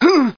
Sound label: jump; SOMC Family Forum